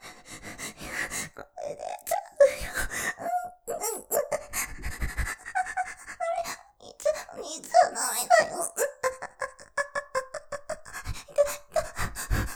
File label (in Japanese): 絶頂スパート声, ASMR; 立体; ステレオ; でちゃうよぉ、ダメ、ダメだよぉ